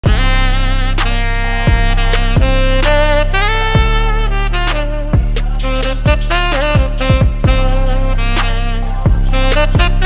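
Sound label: background music ringtone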